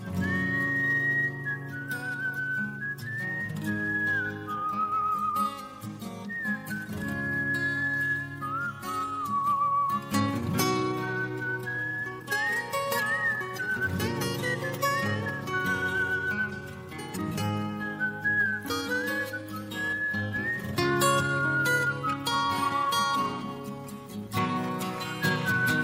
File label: Whistle